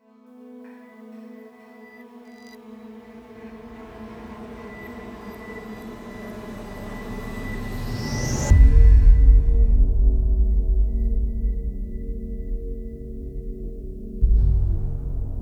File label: Until Death Do Us Part Drum Mix, Horror